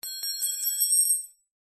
industrial wrench spanner metal small drop garage floor; zapsplat